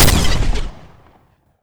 railgun fire; weapons

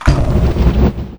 flamethrower deploy; weapons

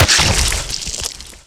sniper gib; weapons; bullets

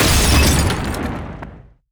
Napalm explode; grenades

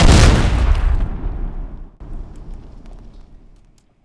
explosion, grenades; Frag

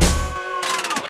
respawn turret retire

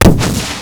slowfield explode, grenades

